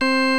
60's Organ

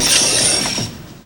SOLDERMAN; Northstar; The Wizard VOL-1

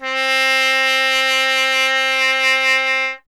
Trumpet C 0; Miroslav Vitous - Solo Instruments VOL-1

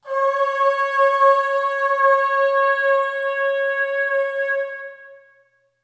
Aah Long C#4 Short Reverb; Harmonic Building Blocks

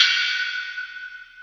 Internal Drums, Roland JD800

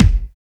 JAMMER KICK; Drums In Your FACE!

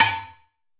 FX bell; PERC

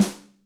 Elem, Electric Snare, Yamaha DTXpress

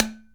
SIDE SLAP, UDU

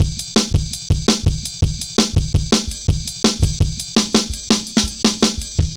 Jazz Ride A, Drum Loops, 166.5; Jungle; Zero-G Jungle Warfare 1, 2 & 3; 90 s Sample CDs

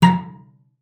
A#5, CELLO FINGER; Xsample VOL-5

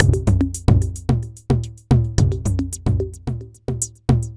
808 toms; Sonic Foundry (Sony Creative Software); Ugly Remnants VOL-2

